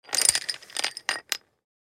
Chalk box rummage; Chalk and Chalkboard

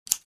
Scissors blade open and close

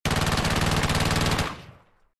Powerful machine gun burst

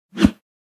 Arrow fly by whoosh

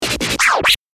DJ vinyl scratch

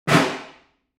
Field drum single

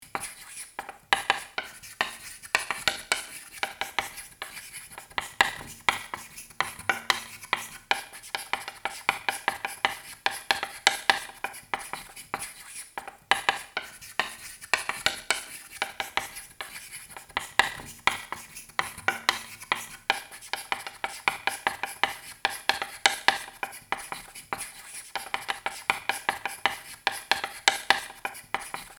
Empty classroom chalkboard writing loop

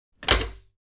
Steam lever machinery mechanical pull turn off

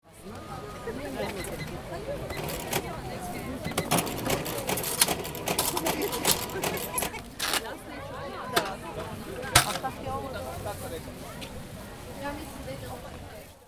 Market ambience voices and money exchaning

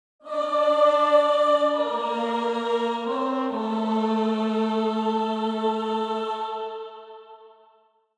Game over you lose epic

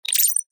Digital futuristic confirm

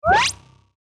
Bar chart pop in animation infographics

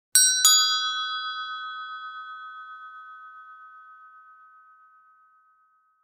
Medium sized handbells ringing together

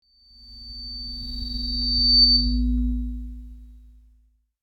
Microphone sting comedy fail

